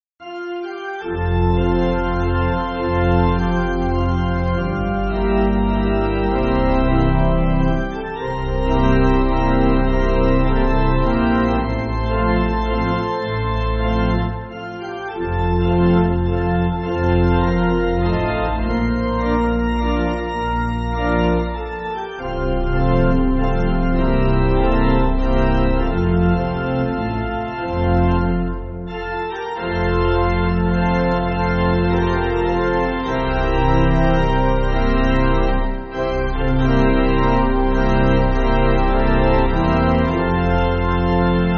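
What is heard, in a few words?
Pipe, Pipe Organ, Music Backing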